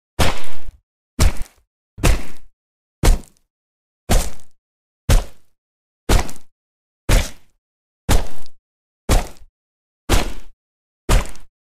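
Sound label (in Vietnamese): Tiếng đấm vào da thịt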